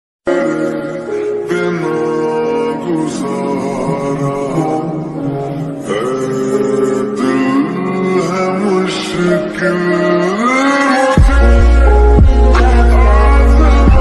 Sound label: sad ringtone